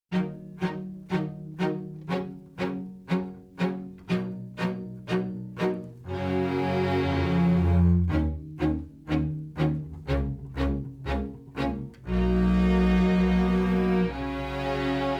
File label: Strings Comp; Daking; Fet III